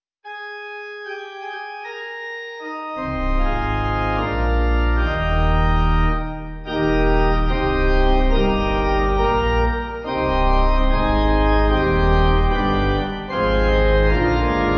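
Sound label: Eb, Pipe, Pipe Organ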